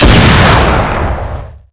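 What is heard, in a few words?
hunter sniper fire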